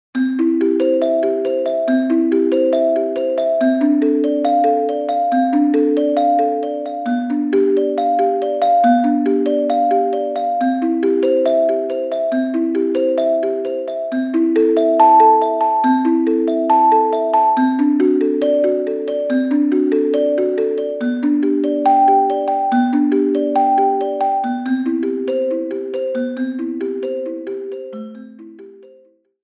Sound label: alesis prestige artist